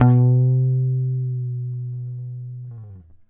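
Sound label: guitar; Univers Sons; Jazzistic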